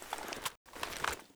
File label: rifle torch use; STALKER; IWP; item usage